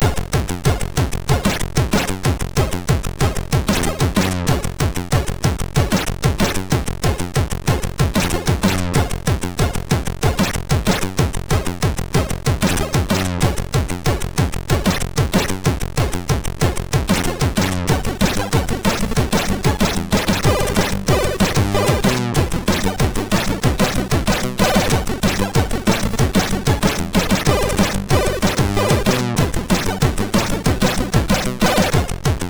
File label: sid MOS6581R3